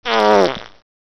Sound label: wet fart pitched